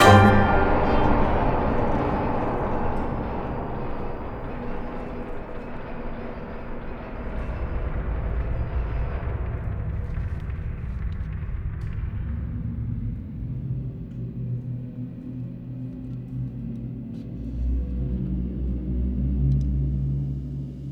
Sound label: Improv Interlude; Navid Navab; sound of montreal